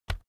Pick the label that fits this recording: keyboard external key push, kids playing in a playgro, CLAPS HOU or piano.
keyboard external key push